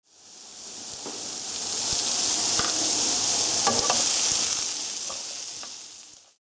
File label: Sub cooking